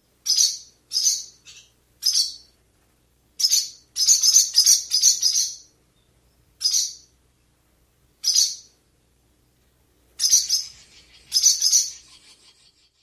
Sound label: chideep alarm calls